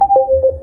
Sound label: door chime; ENT